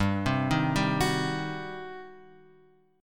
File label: G Major 7th Suspended 4th Sharp 5th, G; GM7sus4#5 Chord {3 3 1 0 X 2} arpeggiated